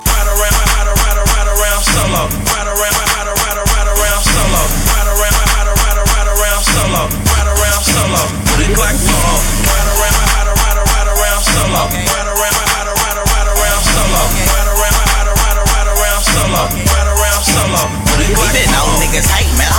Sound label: Solo